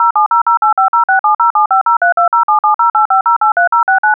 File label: dual-tone; audacity; MINOS